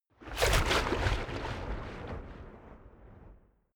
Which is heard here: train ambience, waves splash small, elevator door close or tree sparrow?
waves splash small